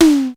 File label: SIMMHI, drum